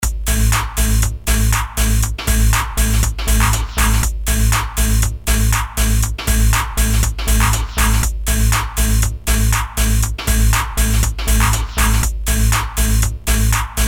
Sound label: music